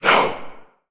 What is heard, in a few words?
gorilla mask land; gorillakingdom